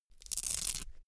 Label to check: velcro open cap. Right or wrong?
Right